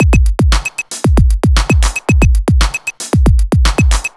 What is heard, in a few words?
Electroooh(115), Beats